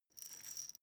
small glass pepper grinder turn slow

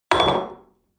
espresso upset table hard, restaurant cafe

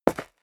step leather shoes concrete grit single right; schritte

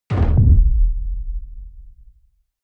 heavy sub noise low; whooshes rises; hits impacts

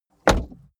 car bmw e46 exterior door rear close fail; Cars

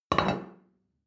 plate place table medium

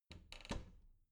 bathroom cabinet door open regular

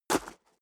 step high heels gravel medium single stair down; schritte; Footsteps; menschen

